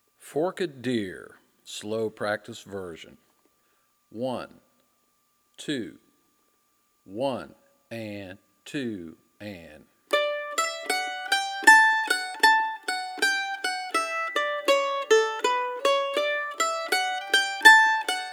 Forked Deer Practice Version

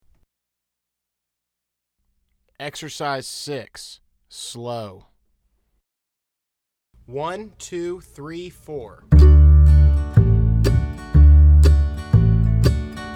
Slow

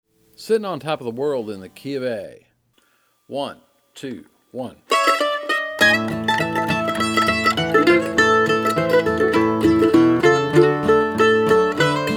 Key Of A

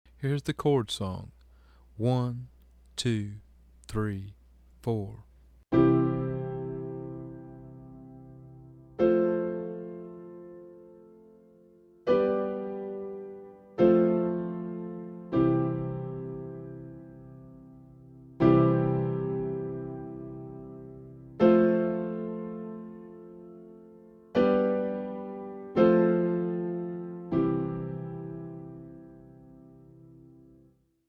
Chord Song Slow

